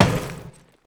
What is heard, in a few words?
ladder, footsteps